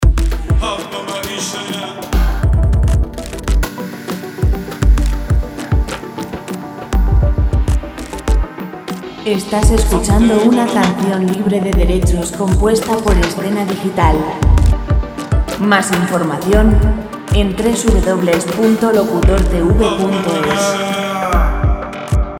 100 folk; folk musica libre

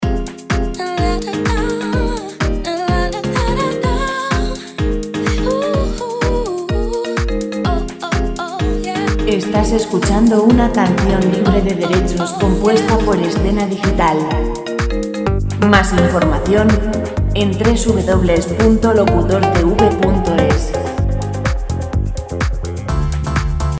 126 vocal; vocal musica libre

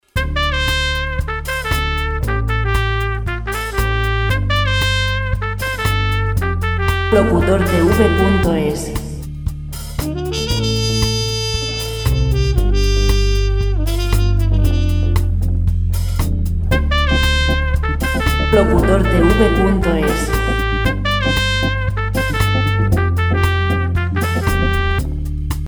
116 jazz; Royalty free jazz

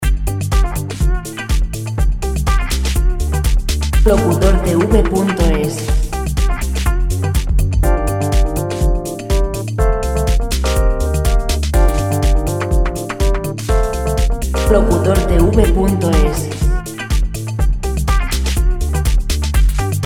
123 pop

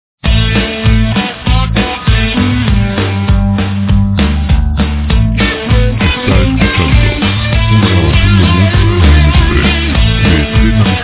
ROCK

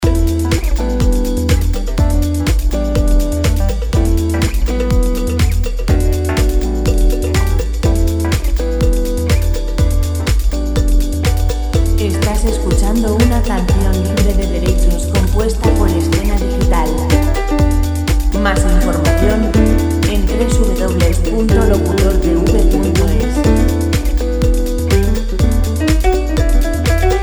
123 jazz; background music; canciones sin copyright

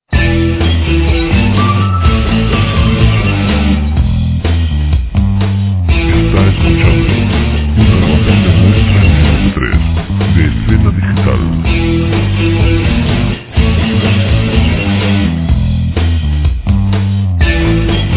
cordel 125 rock

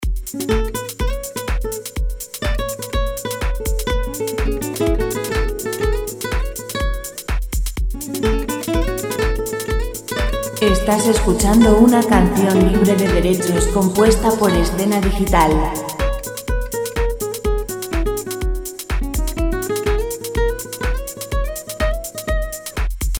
guitar lent musica; relajante musica libre

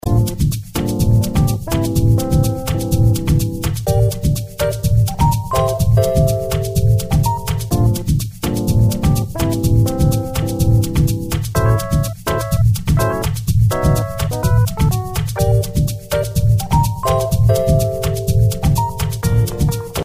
125 chill; background music

